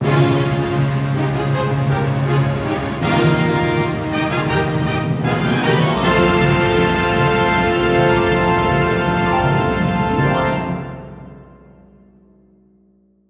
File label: 100% Fanfare; Kirby's Return to Dream Land Deluxe Original Soundtrack; Merry Magoland